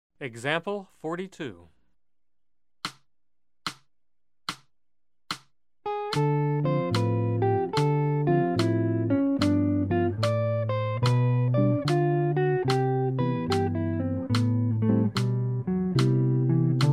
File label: Jazz; jue shi ji ta